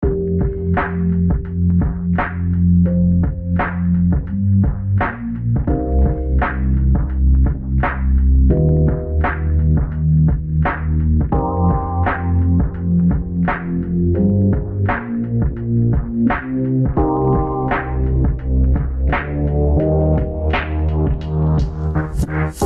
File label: lofi; radio